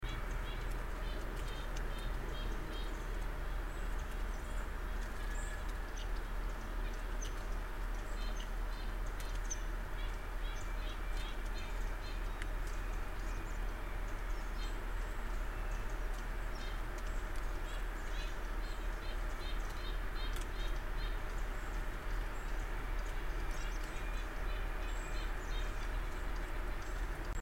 red-breasted nuthatch

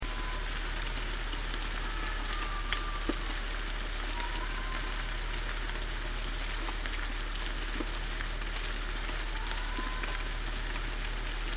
deer

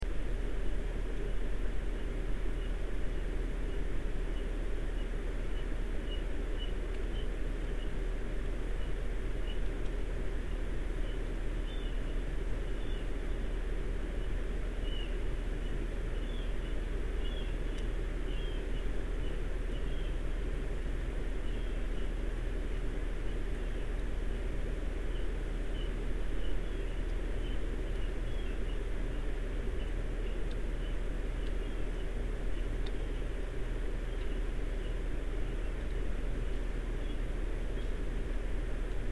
otter mystery

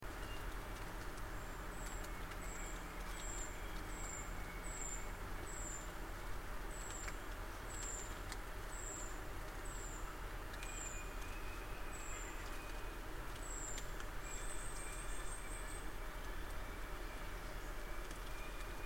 high calls

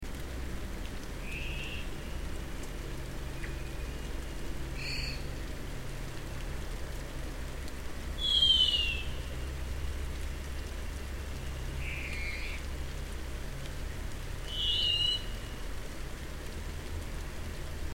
varied thrush

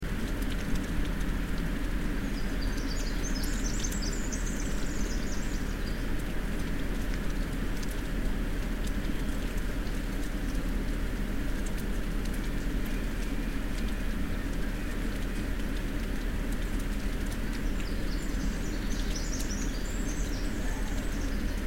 winter wren

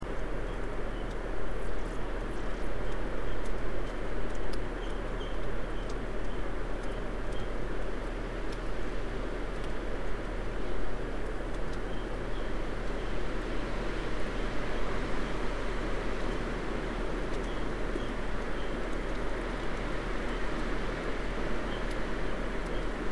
otter mystery call

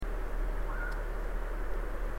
mystery call

